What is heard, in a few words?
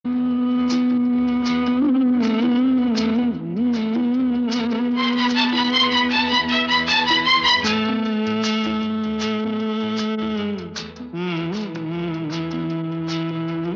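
theme music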